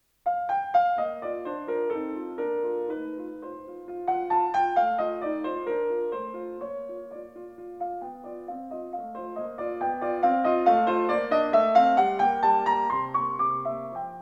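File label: Piano Sonata No. 11 in B flat major; Wilhelm Kempff; Beethoven; Piano Sonatas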